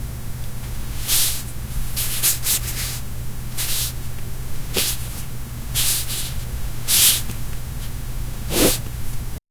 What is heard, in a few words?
standing and tip toe skin, standing